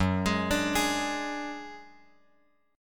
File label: F sharp Suspended 2nd Sharp 5th; F#sus2#5 Chord {2 X X 1 3 4} arpeggiated